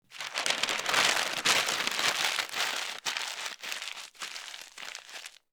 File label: paper rustle; Books and Paper